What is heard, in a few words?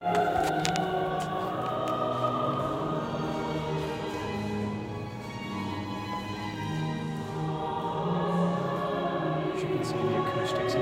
church and classical music; Princeton